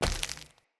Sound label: Walk Default, Footsteps; Friendly; Avakot